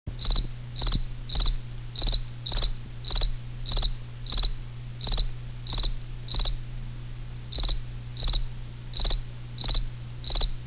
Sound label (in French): grillon